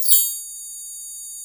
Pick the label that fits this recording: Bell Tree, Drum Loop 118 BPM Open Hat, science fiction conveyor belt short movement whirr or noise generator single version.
Bell Tree